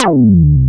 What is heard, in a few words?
slide bass; FUNK